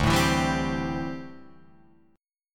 D 7th Suspended 2nd Suspended 4th D, Guitar Drop D